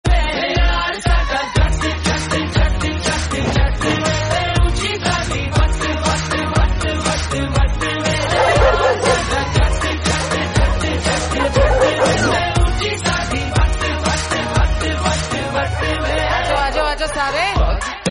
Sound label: Sad Ringtone